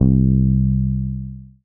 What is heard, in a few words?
Bass Guitar C; Basses; Cymatics; Eternity Sample Pack